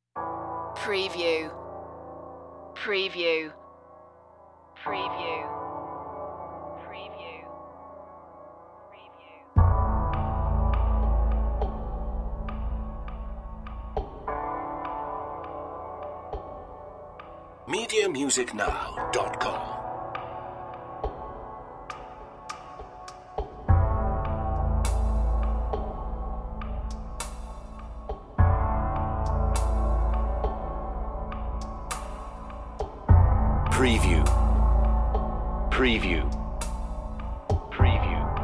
inner struggle no strings

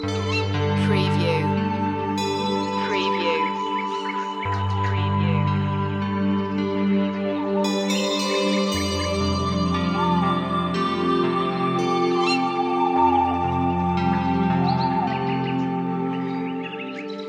perfect bliss loop

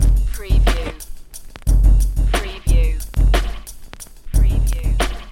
Hip Hop Drum Loop 90 BPM - Straight With Vinyl

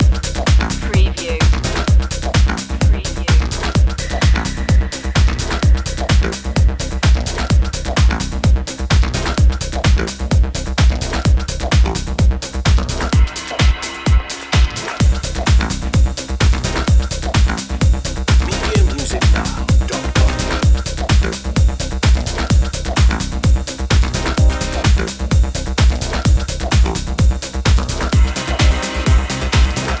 groove station loop

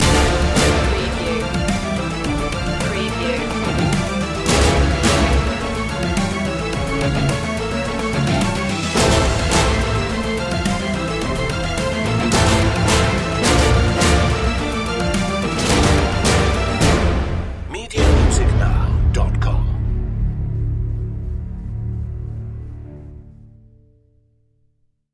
battle in 8-bit space underscore

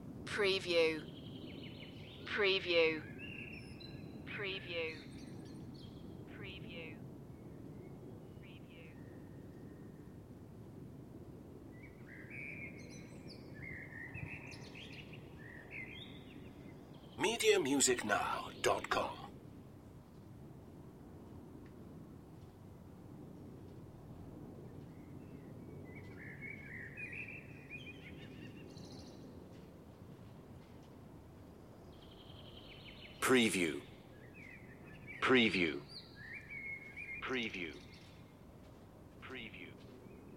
Birds Summer Evening Plane

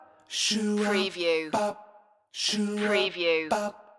sunny day loop

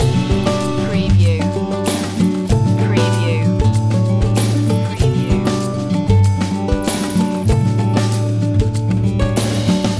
think casual loop

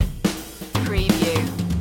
loop start

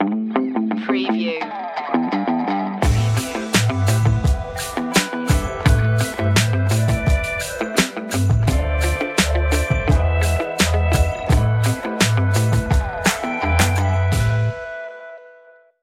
urban hip hop

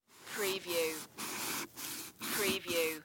Cantaloupe Cut Knife

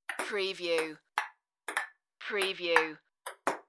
Ping Pong

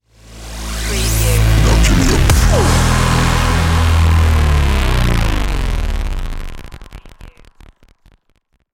gimme a beat stinger

